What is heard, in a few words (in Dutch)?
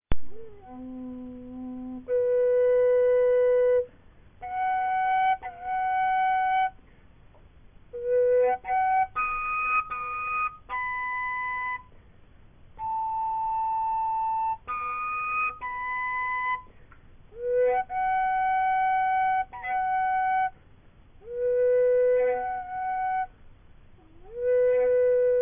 boventoonfluit